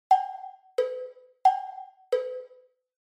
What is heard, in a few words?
Cowbell Phrase Reverb